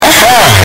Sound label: loud villager noise